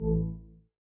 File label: Windows Balloon; Glass